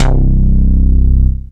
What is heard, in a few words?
Attack FX Bass